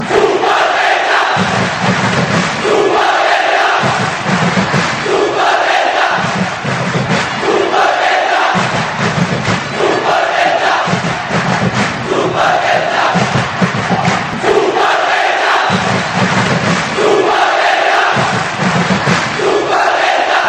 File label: clap fanchants; super elja